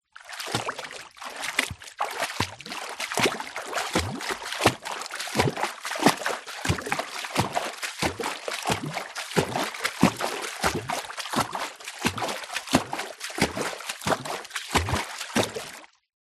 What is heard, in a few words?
footsteps deep water; foley